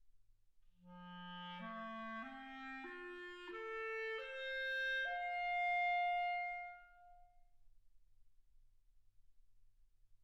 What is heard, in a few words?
clarinet